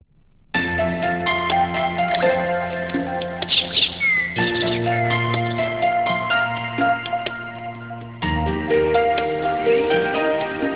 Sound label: MIDI; Okapia; Signature L